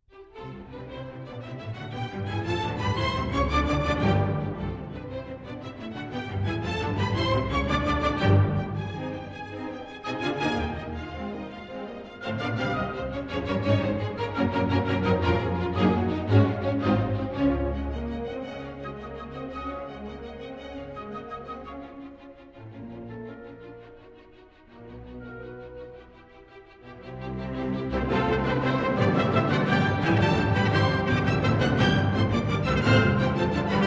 Symphony No. 1 in C Major, Op. 21 III. Menuetto. Allegro molto e vivace